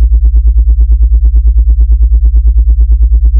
generator hum; OneShot Fading Memory

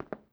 step tile soft; OneShot Fading Memory

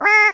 piano e

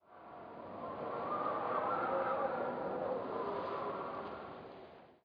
ball fly

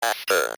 laughter

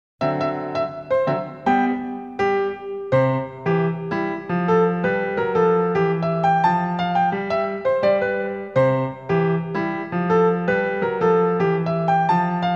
Above Ground BGM; NINTENDO

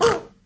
piglette collision

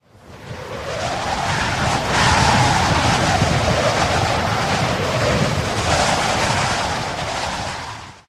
high altitude; OneShot Fading Memory

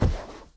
step rope; OneShot Fading Memory

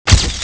cam shot

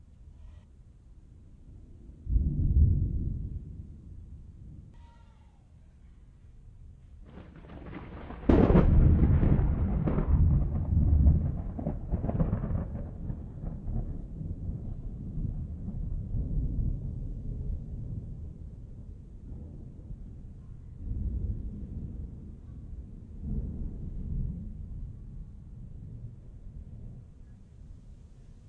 thunder

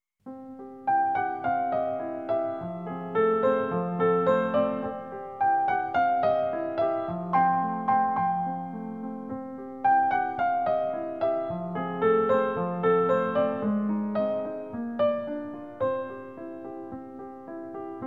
Music Box; NINTENDO

